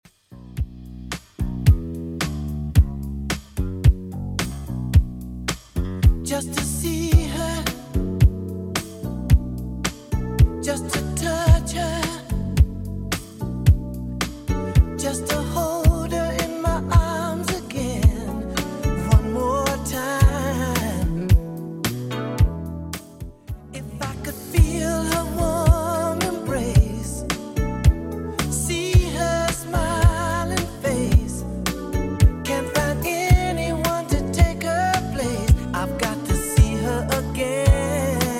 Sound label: 80s Redrum, 110 bpm